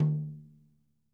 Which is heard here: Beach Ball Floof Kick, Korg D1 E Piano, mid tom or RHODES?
mid tom